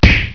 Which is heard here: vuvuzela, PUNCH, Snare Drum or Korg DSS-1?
PUNCH